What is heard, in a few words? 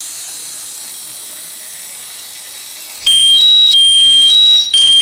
gas release hiss and electronic alarm beeps; alarms